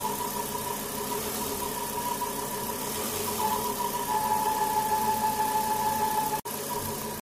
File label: whining noise new timing belt bearings had no effect volvo noise